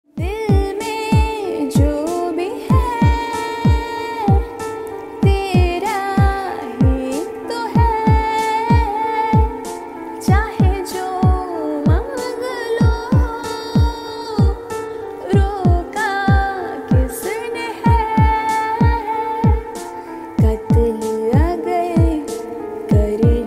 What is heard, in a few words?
Female Version